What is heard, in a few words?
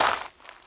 walk gravel